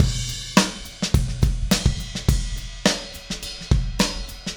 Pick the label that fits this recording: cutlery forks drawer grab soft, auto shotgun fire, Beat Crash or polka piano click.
Beat Crash